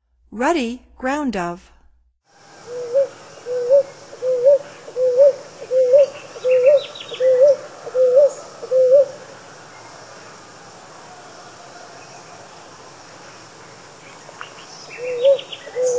Ruddy Ground-Dove; North America; Stokes

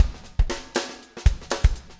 breakout 120, 120bpm loops

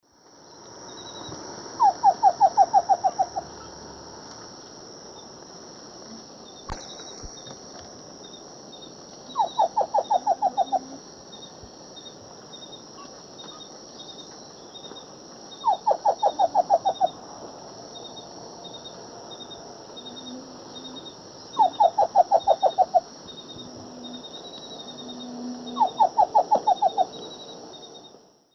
Hylopezus fulviventris, song; S. America; Eastern Lowlands

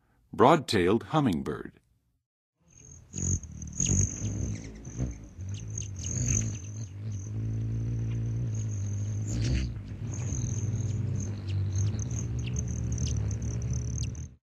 Broad-tailed Hummingbird, Songs of Birds; North America; Western USA; Western USA Songbirds

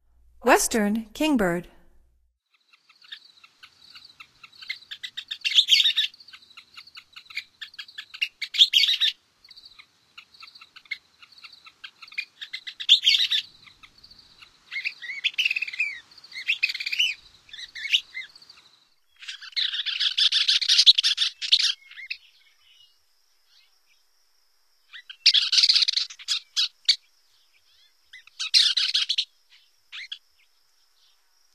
Western Kingbird, Songs of Birds of North America; Stokes

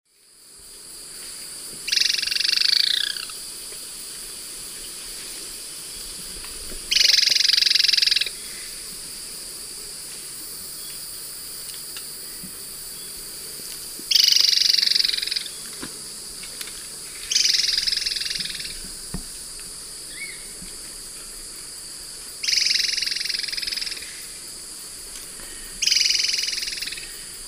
Dichrozona cincta, alarm call; S. America; Eastern Lowlands

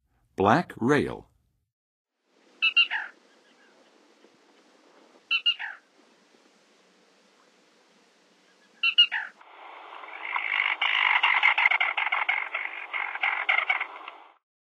Black Rail, Songs of Birds of North America; Western USA; Western USA Songbirds

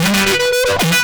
Hyperfuzz, Guitars

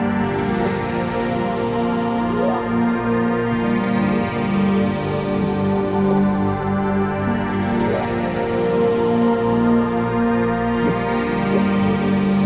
Pad; Dance

